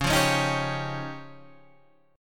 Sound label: F 7th Suspended 2nd Sharp 5th